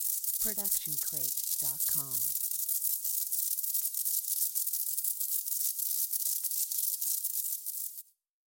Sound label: fuse; soundscrate